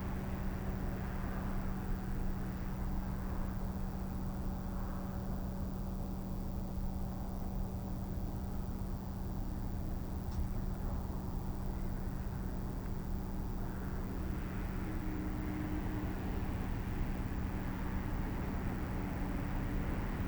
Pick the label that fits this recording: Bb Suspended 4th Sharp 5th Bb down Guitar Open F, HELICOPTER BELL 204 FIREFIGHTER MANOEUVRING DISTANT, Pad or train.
train